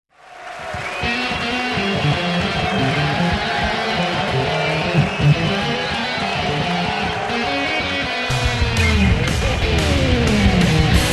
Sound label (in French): Style rock; guitare